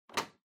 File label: TV Hardware Earphones Unplug